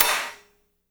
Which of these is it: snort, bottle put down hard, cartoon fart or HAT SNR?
HAT SNR